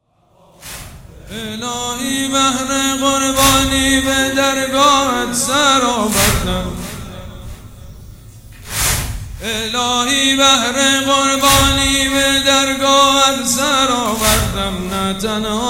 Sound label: Music Madahi